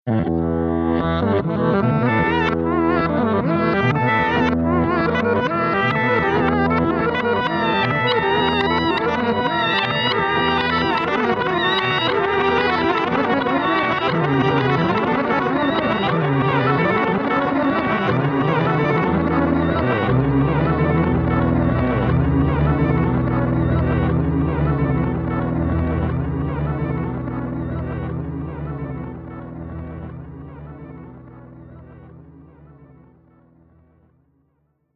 Fender Strat Rocktron Sacred Fire Hard Wire DL8 Reverse Roland JC120